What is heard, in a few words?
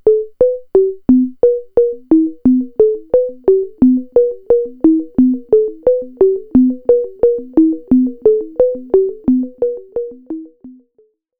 Behringer Space FX B2500 Filterping Delay